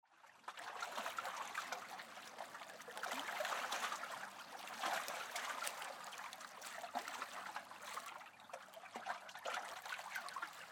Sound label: waves